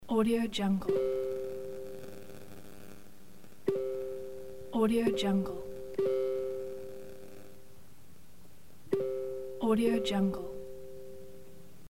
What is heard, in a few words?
new message notification